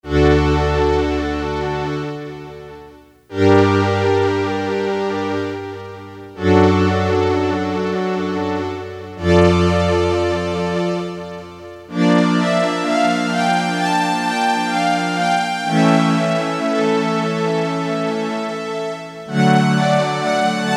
Strings, Casio CT-370; Tones

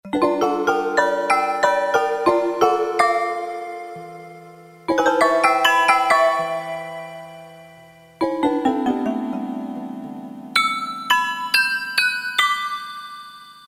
Wind Bell, Roland MT-32

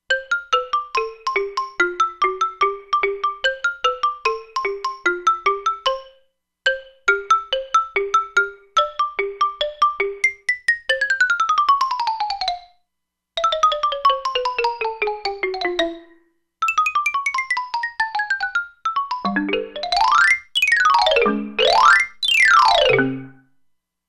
Xylophone; E-mu Proteus-2

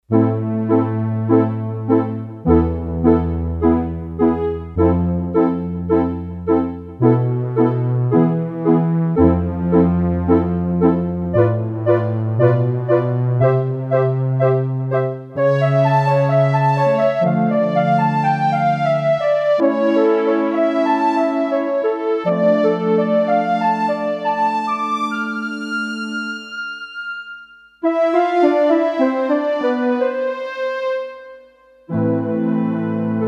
Roland D-10 Ensemble Series; Factory Patches

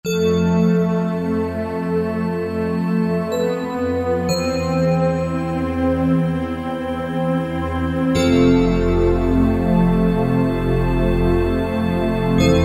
Bell Pad, Korg Wavestation A-D

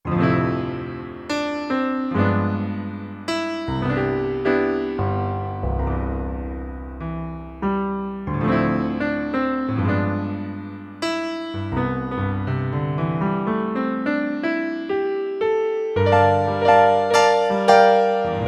Roland D-70 D Piano; Factory Performances

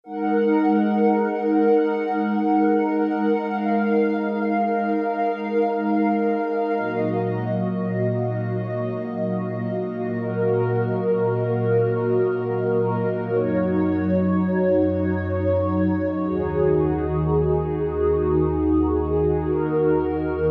Koreana Pad, Kurzweil K2000; Kurzweil K2000 Contemporary ROM

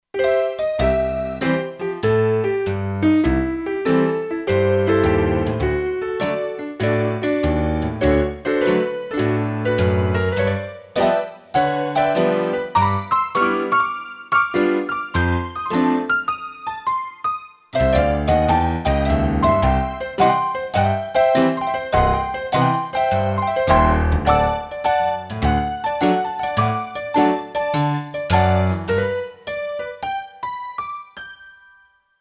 Tack Piano Stack, Kurzweil K2500X; Factory Programs

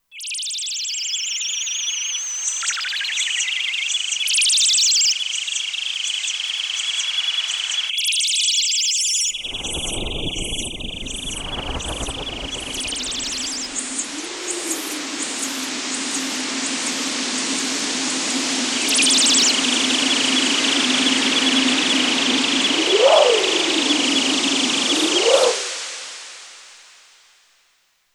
Environments; Factory Programs

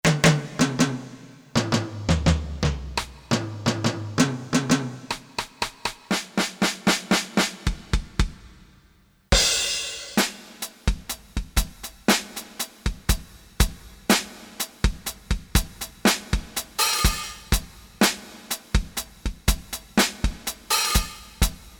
DR kit, Korg DSS-1 Drums; Drums III

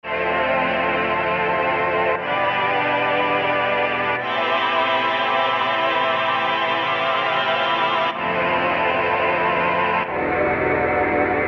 Pad Voices, Alesis Micron; Factory Programs

